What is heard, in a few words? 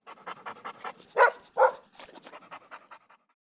pet dog